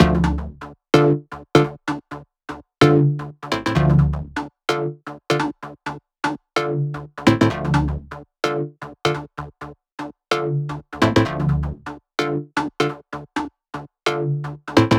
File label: 128BPM Melody; Vengeance Samples; Emote Kit